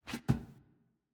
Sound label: Footstep Basement Wood Rotate